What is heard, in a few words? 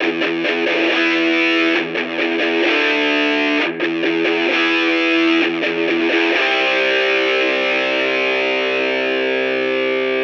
57 c mini, amp samples